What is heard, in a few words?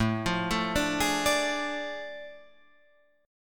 A Major 7th Suspended 4th Sharp 5th, Guitar, Open F; arpeggiated